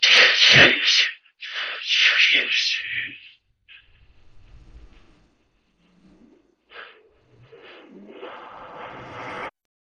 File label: jingle